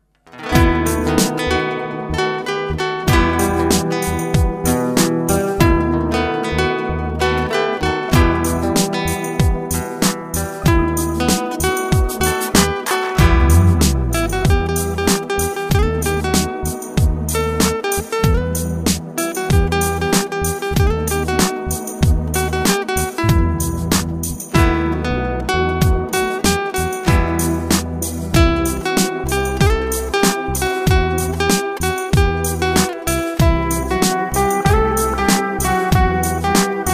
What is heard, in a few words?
Bi Kalam Guitar